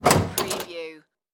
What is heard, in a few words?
Gymnasium Door Open